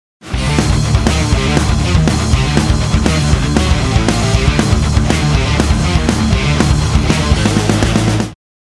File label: modal mixture 1 - piano